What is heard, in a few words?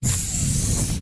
jetpack Charge; fraction robot collector